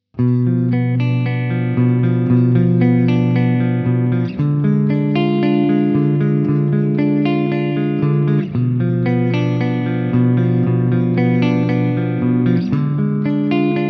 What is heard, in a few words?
Mesa Triple Clean; Not The Cleanest; TONE X; machine modeling